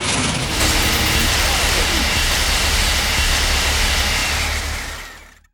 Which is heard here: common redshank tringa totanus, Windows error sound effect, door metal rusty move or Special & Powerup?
door metal rusty move